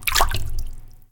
Fluid Impact Hard

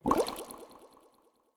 Fountain recover Bubble

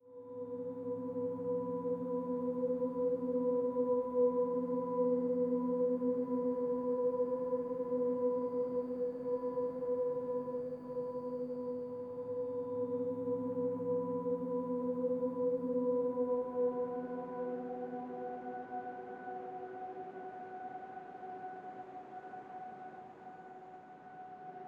Empty Pad Phrase; Wod Premonition Prolog

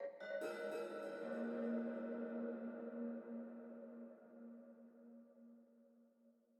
Env Field Night Cave

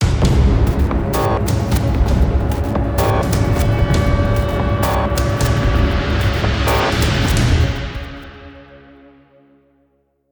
cinematic game Over; Drifting Lands

